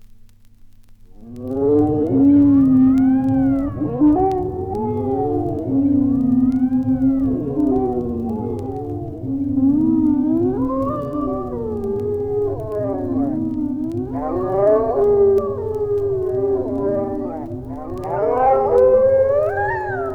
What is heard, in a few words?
wolves baying at the moon